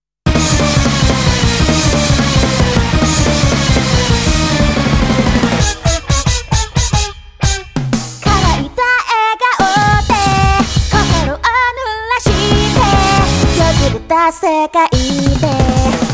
Groove; Groove3; crossbeats rev.; arcade

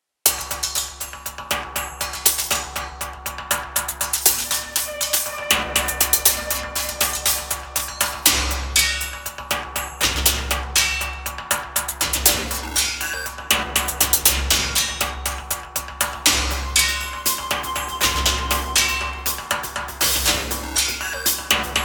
Outpost Music; A Bloody Night

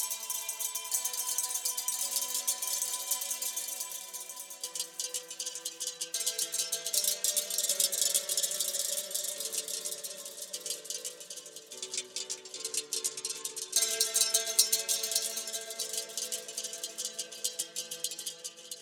Forest Dulcimer

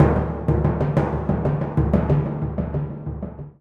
Timp

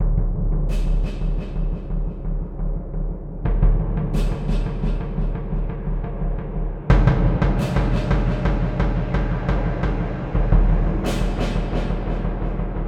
Lo Mid Perc

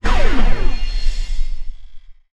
Battle end; Blastmorph Pinball; xbox series xs

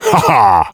Male Ha Ha; Blastmorph Pinball; xbox series xs

